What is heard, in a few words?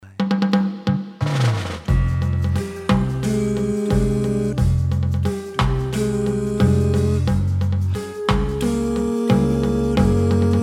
Tenor